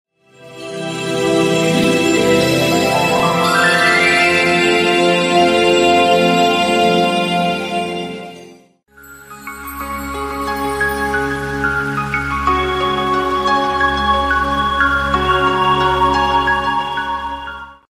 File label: sms nokia ringtone